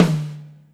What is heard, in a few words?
tom, Drums Acoustic; USB Soundscan vol.10; AKAI; 90s sample CD; DYNAKIT 3